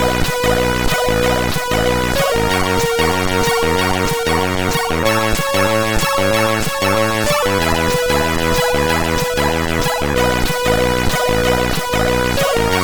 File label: remix, CSG8580R5